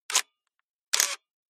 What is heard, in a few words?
Camera Film